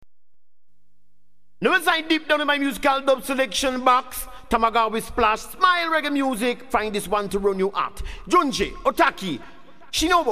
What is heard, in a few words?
Smile Reggae Music